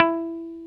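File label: guitar, Dan Electro, 6 string; Dan-O 6 Str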